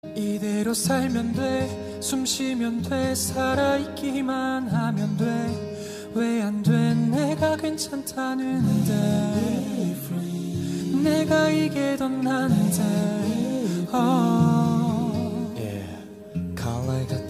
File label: Acoustic